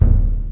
TOM, Industrial; USB Soundscan vol.24; AKAI

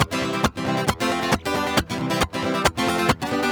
MERENGUE, World Grooves

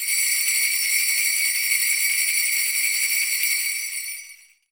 sleighbell; ILIO; Synclavier Percussion 2; World & Orchestral

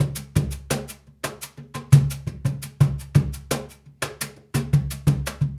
Cajun, Percussion, Drums; Essential Percussion

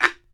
BAMBOO, World Assortment

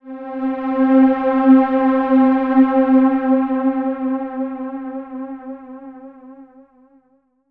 Ambient Pad C4; Trance Formation

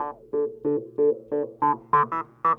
clavinet, analog funk; Mixed Berry Jam; Sonic Foundry (Sony Creative Software) - What it Is! 70s Analog Funk

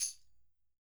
Roland MV8800 percussion

